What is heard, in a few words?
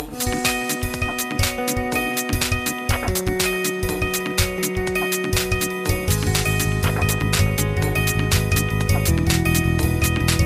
Infinix Note 10, ringtone